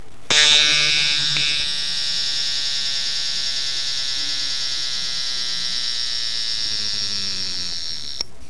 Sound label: alarm